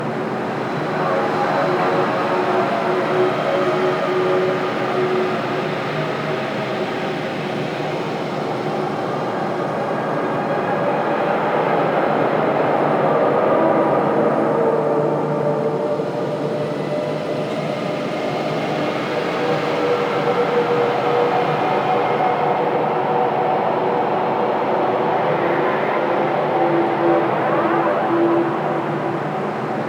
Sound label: Galleon Interior Ambience; Warframe; Grineer Galleon